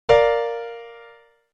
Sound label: bVII